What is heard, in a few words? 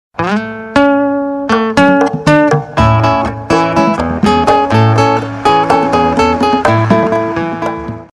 guitar sms ringtone